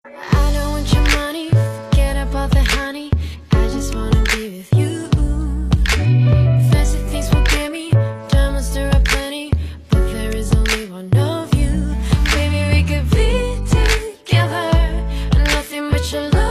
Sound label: music; pregnancy guard android